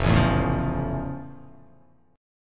F Augmented Major 7th, chord; F+M7 strummed; 1,0,2,2,1,0,x,x; JGuitar

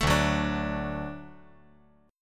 D Major D down

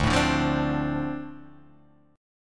D-Minor 7th D, chord

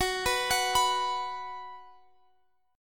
B 5th F sharp, chord

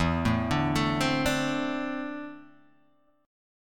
E 7th Suspended 4th Sharp 5th, Guitar, DADGAD

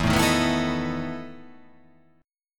E Major 7th Suspended 4th E

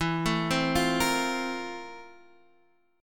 F Major 11th, E

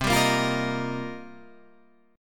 C Minor 11th C Guitar Standard; strummed; JGuitar

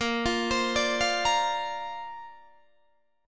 A sharp Major Add 9th, Guitar Open D

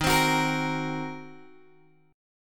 D sharp Minor 6th D sharp down Guitar Open G

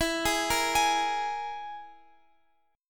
E-Major Flat 5th E, Guitar Open F; arpeggiated; x,x,16,15,13,15

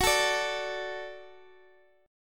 F sharp Minor Sharp 5th, Guitar, Open G; chordshape; down

